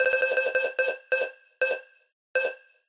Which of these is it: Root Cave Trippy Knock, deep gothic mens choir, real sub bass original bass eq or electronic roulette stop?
electronic roulette stop